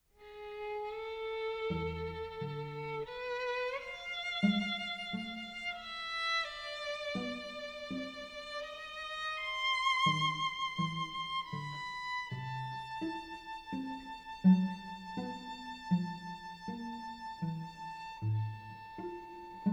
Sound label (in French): Quatuor; Choveaux